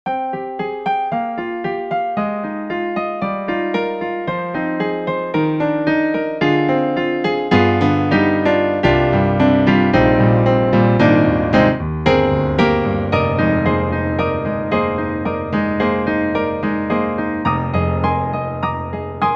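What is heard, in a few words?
rock grand piano; EDIROL; Super Quartet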